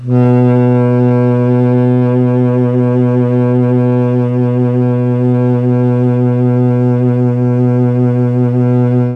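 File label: B1 tenor sax, mellotron; CHMB TNR SAX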